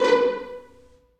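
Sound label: 1st violins pizzicato A#4; Sonatina Symphonic Orchestra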